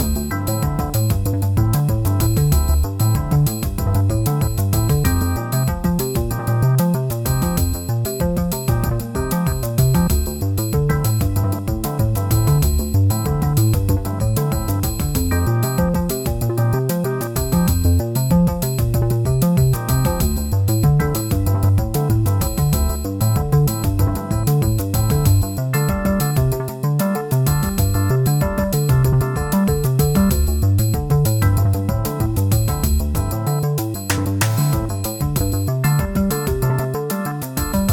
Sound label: Pixel Balloons, puzzle music